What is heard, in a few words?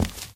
walk tile, footsteps